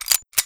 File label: Pistol Load; HapticSamples; Weapons; Feel; NiceVibrations